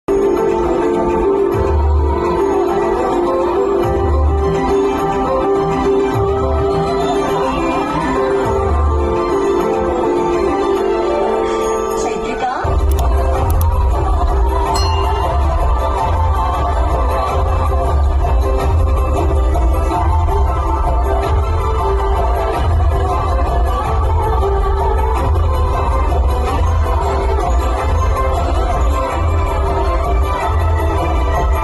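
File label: heroine entry background music ringtone; shaakuntalam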